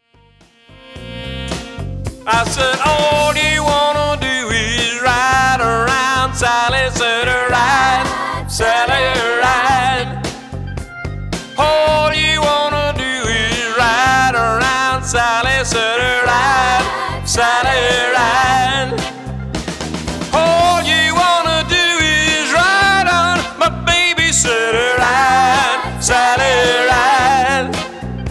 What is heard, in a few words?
Vocal